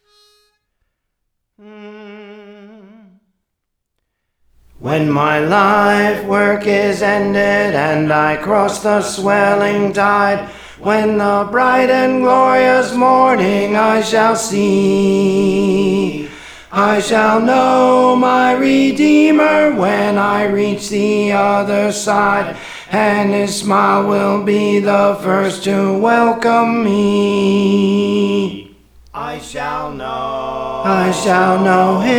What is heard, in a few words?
Tenor; Praise For The Lord Training